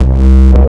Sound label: Synth Bass Bit Growl Bass